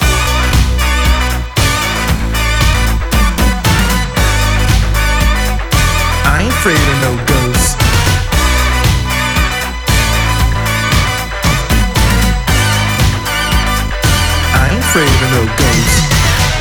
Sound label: Vocals - Rackup Loop Long Vox